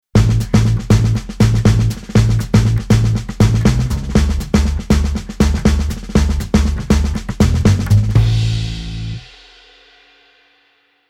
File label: Bass Country